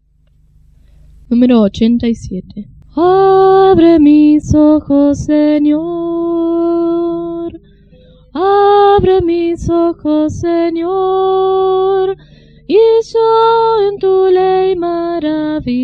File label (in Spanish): Coro, himnario niños